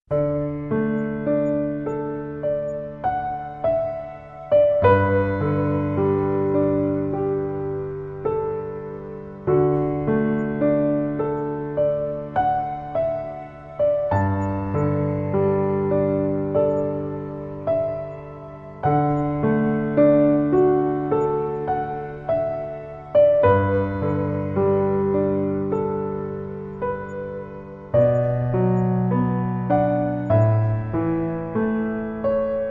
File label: Piano, Baby Lullaby Piano Sleep Music